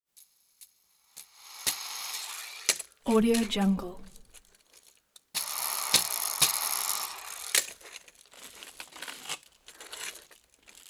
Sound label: roller skate rolling wheels bearings spinning